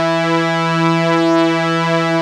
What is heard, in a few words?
OMNI STRIN, ARP Synths; E-MU Formula 4000 Series Vol. 3; Analog Odyssey; ARP Synths II